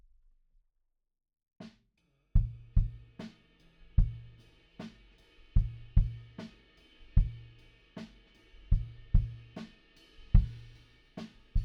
kick in

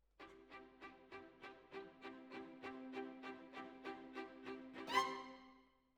Violins Over

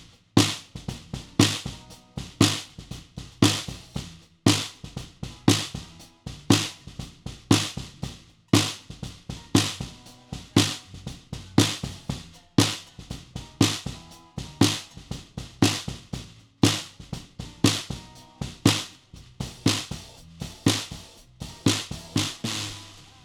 Snare

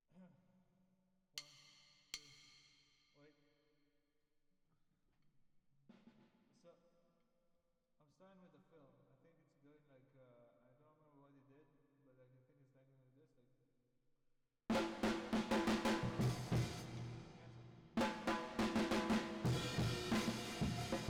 Drums Verb

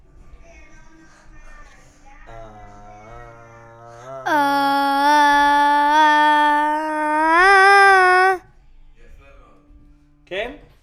VOC

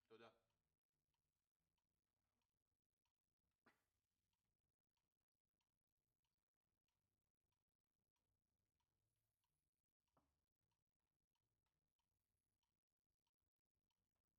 Overheads, 94bpm

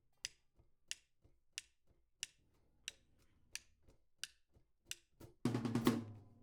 Hat

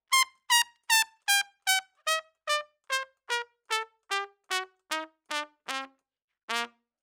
Trump

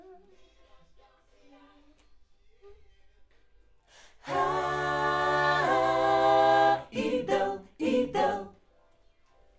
Schoeps AKG, piano

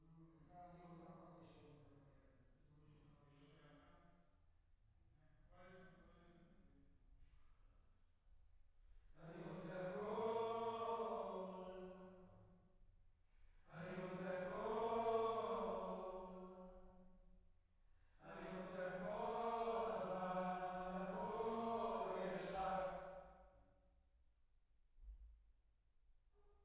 Choir

